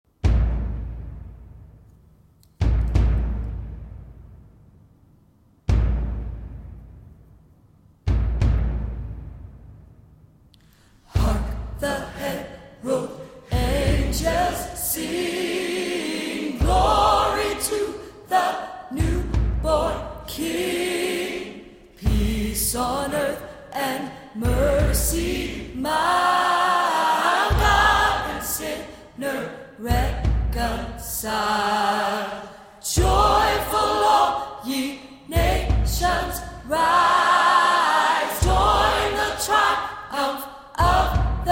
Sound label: Hark The Herald Angels Sing Sop; Christmas 2020